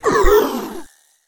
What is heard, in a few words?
Undead Stinking Knight Hit; Blind Quest - The Frost Demon